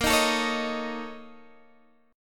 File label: A sharp Minor Major 7th Double Flat 5th, Guitar Open G